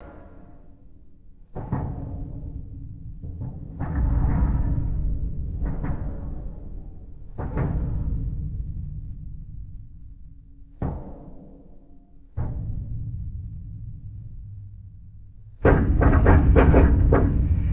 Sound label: metal pipes rattling and knocking with deep rumble; ambience